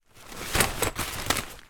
household camping chair in canvas cover fall over onto camping gear